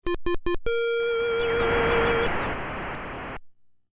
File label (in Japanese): ファンファーレ (PSG版); fray msx remastered soundtracks 2008